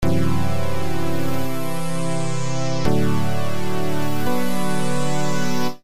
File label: float synth